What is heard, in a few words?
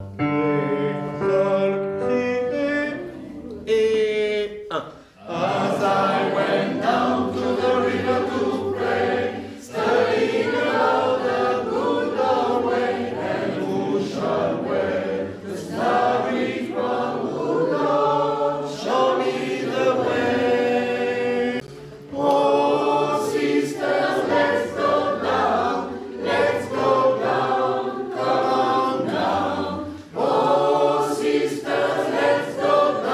DOWN TUTTI